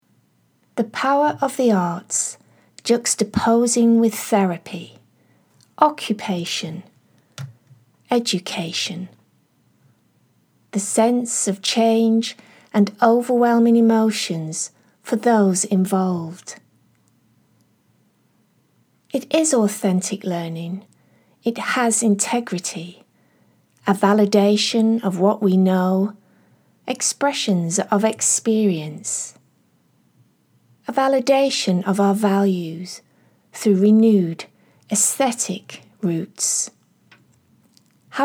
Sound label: vocal; Roots and routes